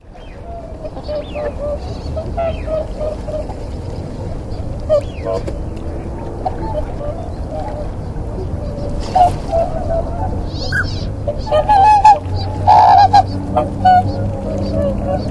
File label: whooper swan